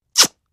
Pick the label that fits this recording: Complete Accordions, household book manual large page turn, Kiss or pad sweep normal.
Kiss